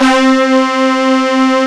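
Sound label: RICH BRASS C2 left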